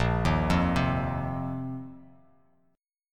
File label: A sharp Suspended 2nd Suspended 4th A sharp; arpeggiated; JGuitar